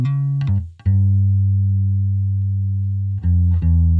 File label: bass; musdb18